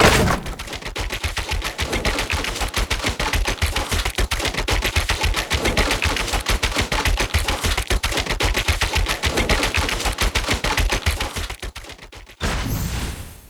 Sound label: wall created; cstrike; pubg